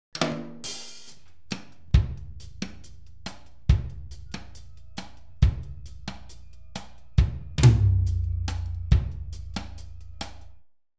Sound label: Ambience Drums